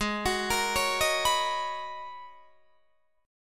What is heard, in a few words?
G sharp Minor 11th G sharp Guitar DADGAD, chord